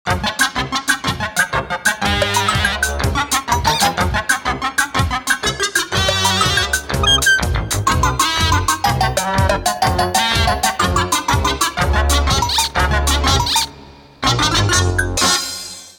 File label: Comic jingle; Jingles medley